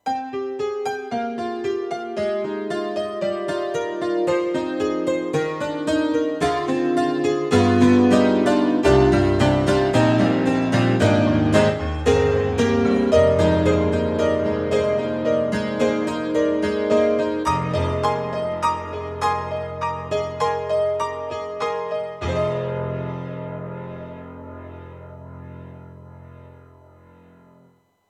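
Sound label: YAMAHA MU500 Detuned Bright Piano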